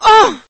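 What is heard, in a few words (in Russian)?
женский голос стон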